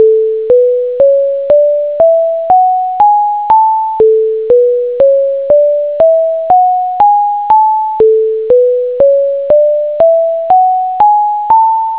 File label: do re mi